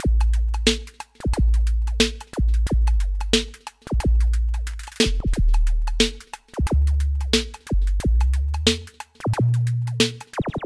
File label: beat; beat making software